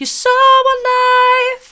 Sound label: mid, vocal